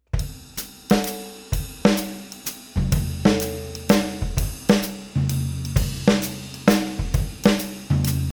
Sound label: jazz waltz; drumming